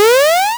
snake hiscore; w00tguy; arcade

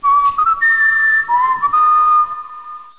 rolling stone; svencoop; hc2

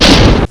flame hit; svencoop; classic; skull

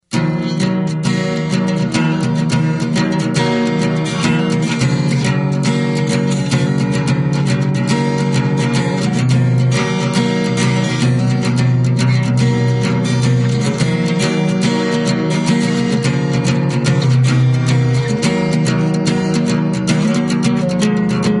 guitar; svencoop; the daikon warfare; stalker; cop

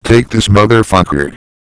throw, hgrunt; svencoop; cracklife

